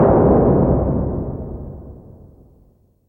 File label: metal reverb bang